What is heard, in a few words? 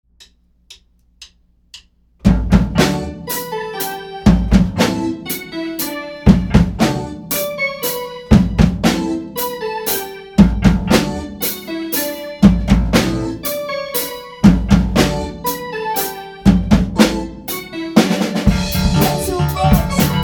Techno; rockbandacademy